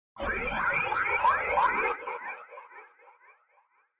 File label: Weird dark vibrating alien type sound.; Scary Tones; Horror; Creepy Vibration